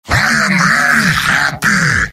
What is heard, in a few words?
heavy positive vocalization; mvm